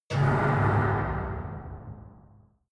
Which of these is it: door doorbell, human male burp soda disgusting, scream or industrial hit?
industrial hit